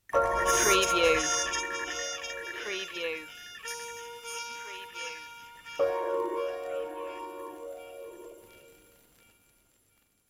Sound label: stoner beat stinger